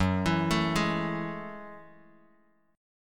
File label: Gb Minor 9th, Bass Standard; arpeggiated; JGuitar